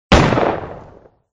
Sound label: gun